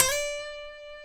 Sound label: E funk, Guitars; Spectrasonics; Hans Zimmer Guitars VOL-1; TAKA FUNK